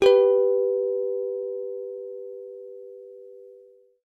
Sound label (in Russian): gitarnie melodii struna a s garmonajzerom